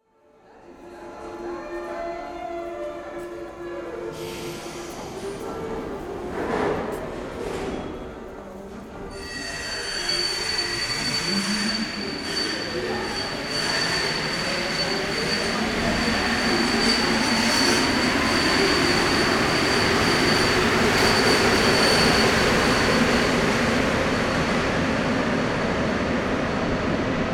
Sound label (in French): Souterrains Louvres metro